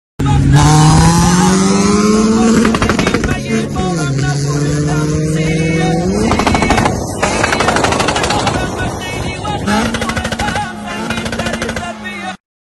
exhaust 220; اگزوز بنزی ۲۲۰

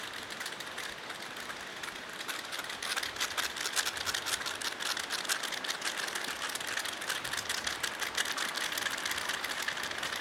Oilbird

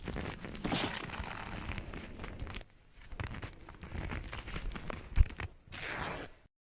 grow

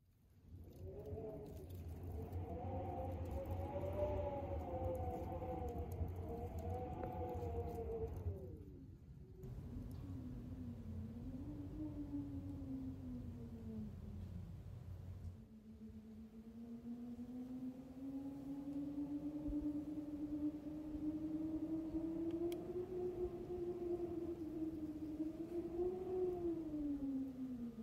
Wind behind window

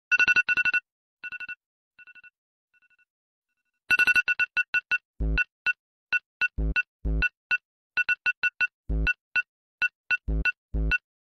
Funk Ringtone

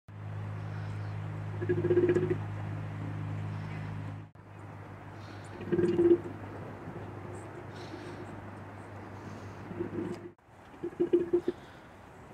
Golden takin

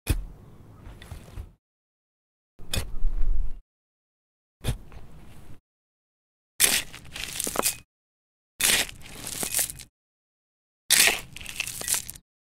Shovel; بیل زدن

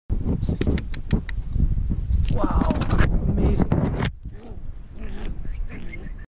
European pine marten; سمور جنگلی

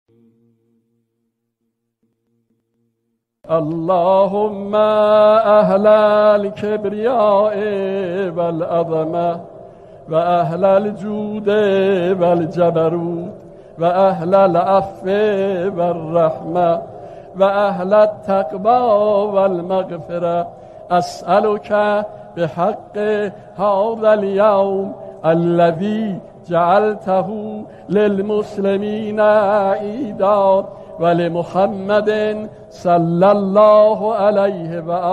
qunut, قنوت نماز عید فطر

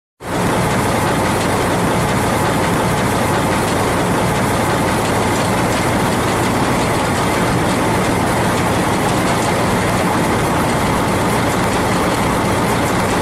Combine

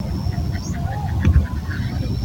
Darter

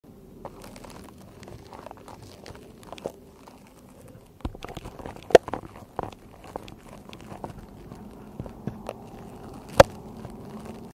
sticky; چسبناک

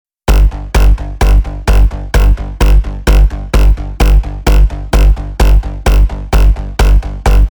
wiggle hardcore edm g house bass kick

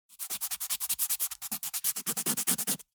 nail file

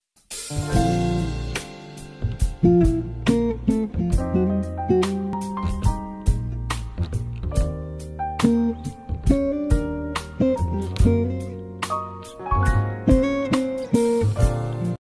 Jazz music, بی کلام

